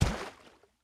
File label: landfill medium; Factorio